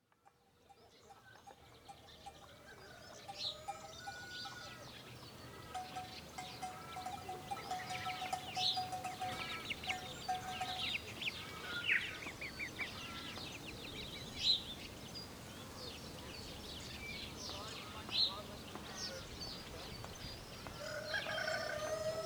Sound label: Ambience; PrinceOfGlory; OracleStudio